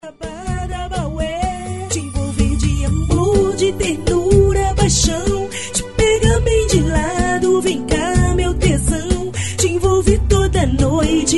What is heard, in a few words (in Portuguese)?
musica; ines brasil